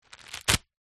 foley packet zip ties open; zapsplat

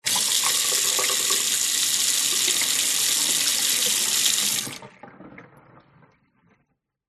household sink metal no plug tap on run off

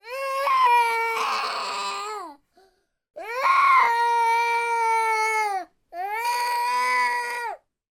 boy three years old whinge scream cry

